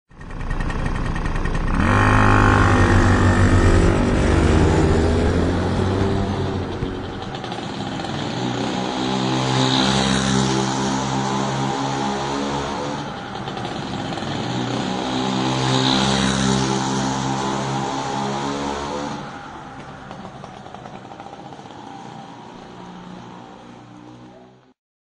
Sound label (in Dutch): Brommer